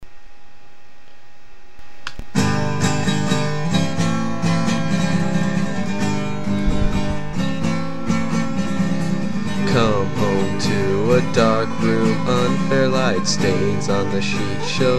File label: Acoustic